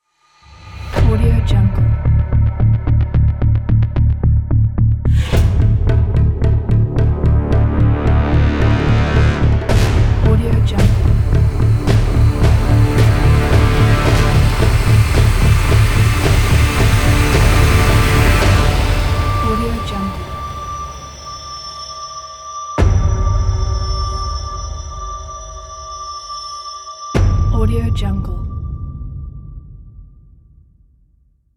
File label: dark tension cinematic trailer kit